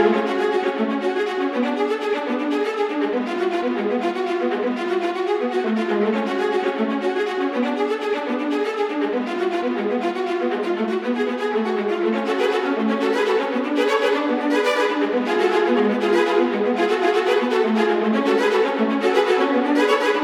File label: viola; виола